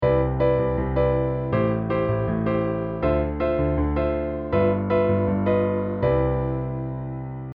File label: I VI II V Tension; I -VI -II -V 進行